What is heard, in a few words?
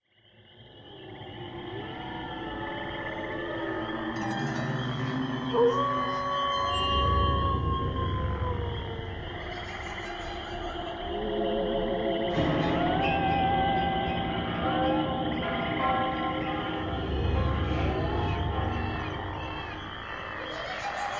atmosphere halloween; Angry Birds Seasons